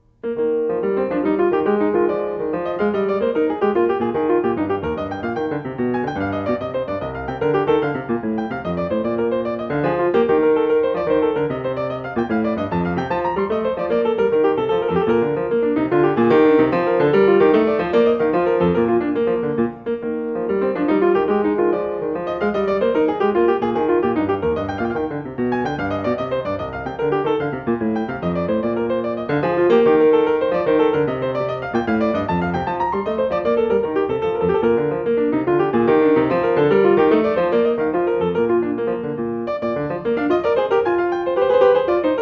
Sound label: Courante; Glenn Gould; Bach The French Suites Nos. 1-4, BWV 812-815